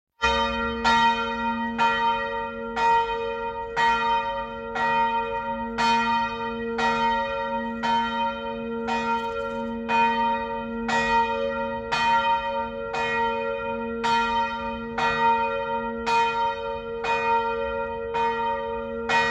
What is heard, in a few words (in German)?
Glocke